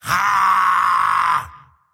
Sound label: attack emote long; slyrak; dota